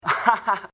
ahahaha

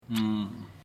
mmm

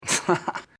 tss haha

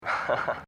eaheheh

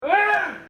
ewwwr

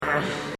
errr

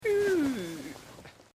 eewwwww

